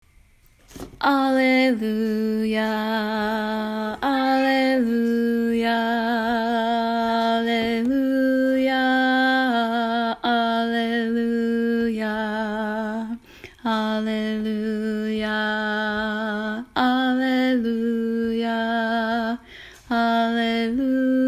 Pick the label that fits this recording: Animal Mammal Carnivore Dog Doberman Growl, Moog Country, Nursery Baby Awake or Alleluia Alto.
Alleluia Alto